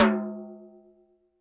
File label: TD-20 Tom A, Roland TD-20 Toms